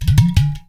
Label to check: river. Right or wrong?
Wrong